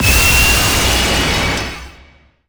Battle

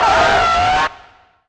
Monster

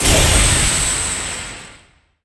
Battle

